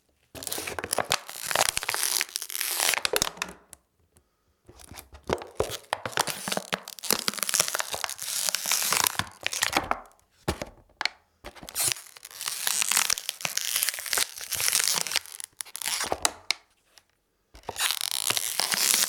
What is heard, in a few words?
Walking On Plastic Bottle